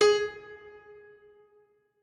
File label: piano